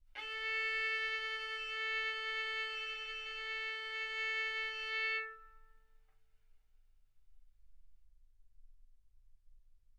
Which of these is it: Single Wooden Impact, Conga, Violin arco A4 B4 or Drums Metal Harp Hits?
Violin arco A4 B4